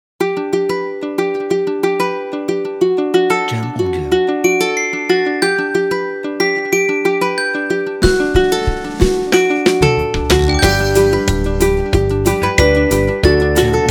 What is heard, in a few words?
Upbeat Opener Corporate